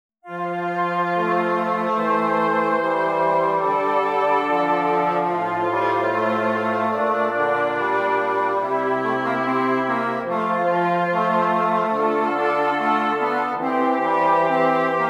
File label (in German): Stimmiger Contrapunkt; 5 Gattung; Ronge